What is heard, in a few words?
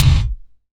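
SDS V BD, bass drum; Best Service; Real Mega Drums VOL-1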